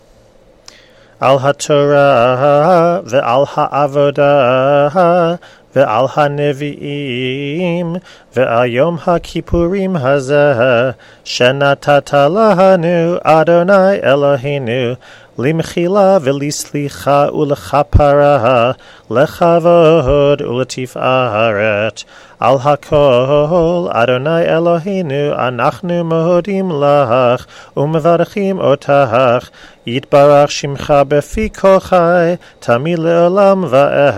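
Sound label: blessing after haftarah end with tune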